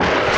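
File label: rocket loop; Falcon 40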